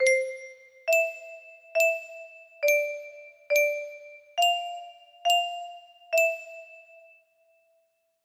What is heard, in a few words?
music box, music box melody